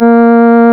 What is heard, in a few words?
REED A#; Big Fish Audio; Synclav FM